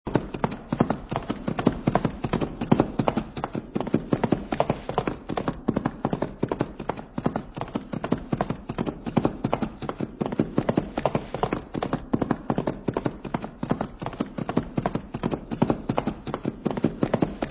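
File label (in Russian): beg dvuh konej, running of two horses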